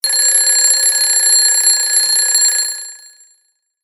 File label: Alarm